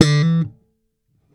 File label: Bass Slap Bright E, Electric Bass One Shots; Slate Digital BASSHOLE Sample Pack